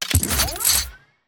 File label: Shotgun Pickup